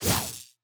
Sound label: close combat shock sweep; CrossCode; battle